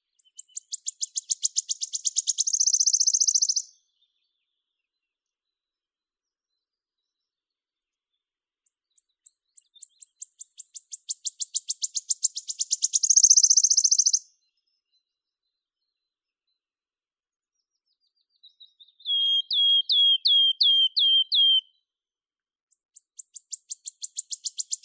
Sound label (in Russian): penochka treshotka phylloscopus sibilatrix